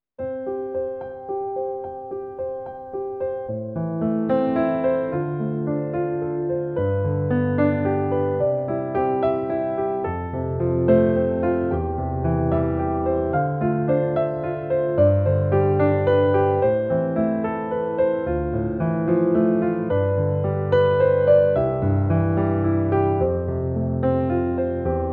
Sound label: Music Only